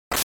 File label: SNES RPG Attack Knife